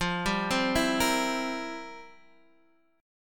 F Major 7th Suspended 2nd Suspended 4th, F, down, Guitar, Half Step Down